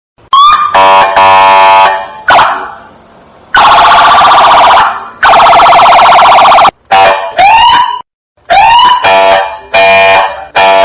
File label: sirene